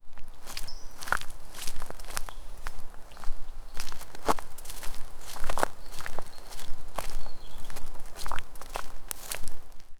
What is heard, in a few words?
soft nighttime forest amb, wolf